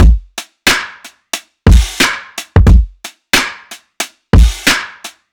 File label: Rimshot